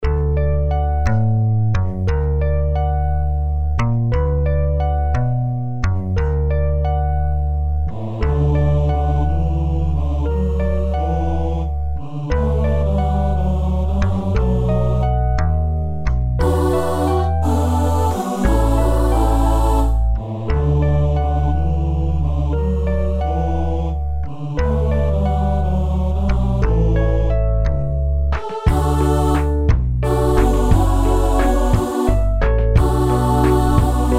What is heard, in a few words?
instrumental